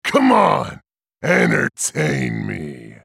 attack; Trundle

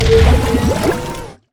hydroblast; nami base

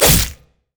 crit attack; Zed

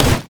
ancient golem basic attack; monsters

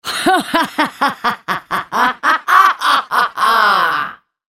laugh; Zyra; en US

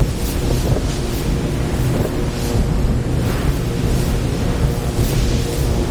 slicing maelstrom loop; LoL; kennen

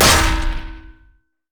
basicattack; Yorick

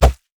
Crit Attack; LoL; DrMundo

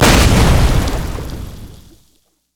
explosive cask boom; LoL; gragas

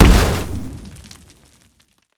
armordillo powerball oh; rammus

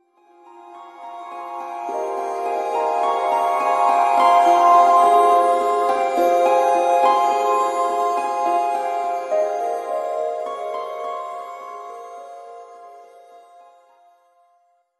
hymn of valor melody; sona

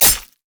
basic attack; LoL; akali

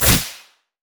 shadowswing; zed shadow

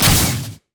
spiritrush; LoL; ahri

